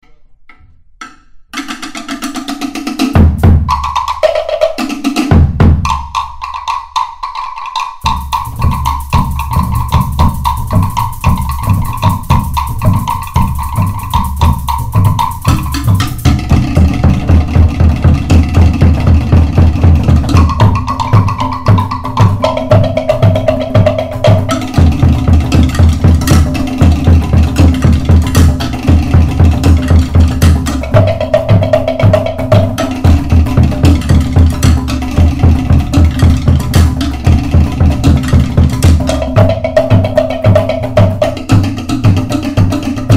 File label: beat; polymusic